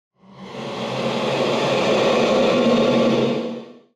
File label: Screamy Screetchy; soundscrate